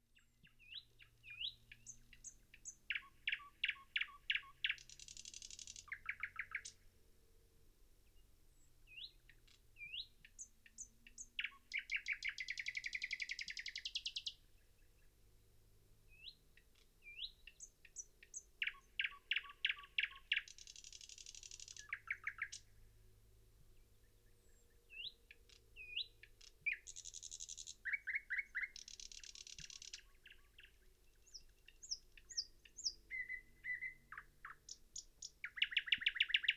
solovey luscinia luscinia; peniya ptits

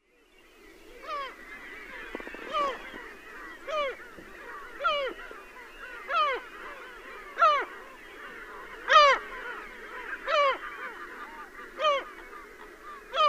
klusha larus fuscus barabensis, zvuki chaek

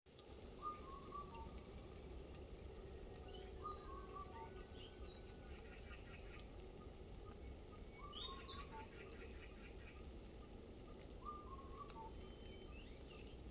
indiyskaya kukushka cuculus micropterus, zvuki kukushki

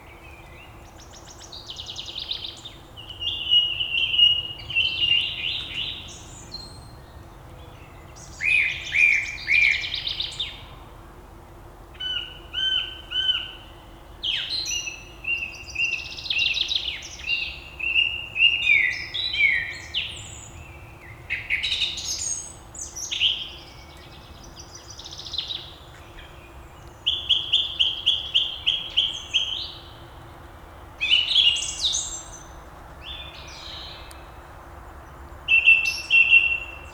pevchiy drozd turdus philomelos; peniya ptits

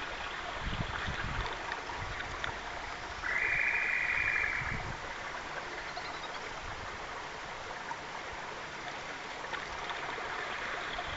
madagaskarskaya kukushka cuculus rochii, zvuki kukushki

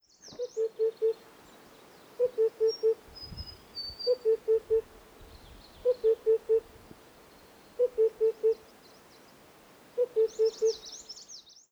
gluhaya kukushka cuculus saturatus

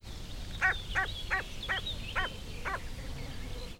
oryol mogilnik aquila heliaca; orla

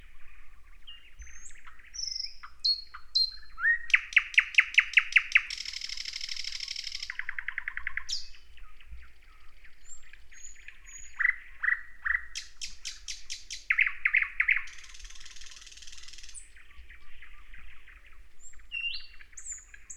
solovey luscinia luscinia; peniya ptits

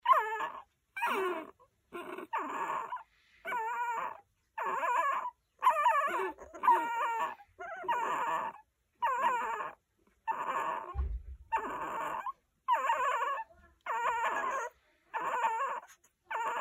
zvuk skuleniya shenkov; malenkih schenkov

